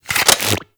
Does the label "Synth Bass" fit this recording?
No